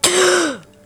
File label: gasp